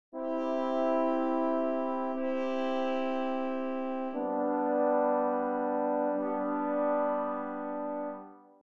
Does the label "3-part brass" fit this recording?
Yes